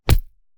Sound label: Fight Impact; SoundsCrate